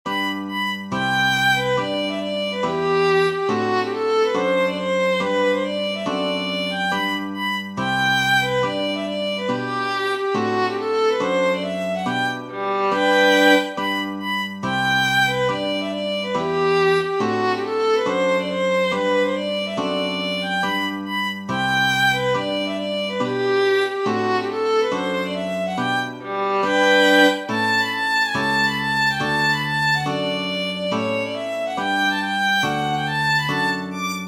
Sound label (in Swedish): Medelpad Schottis; Lindkvists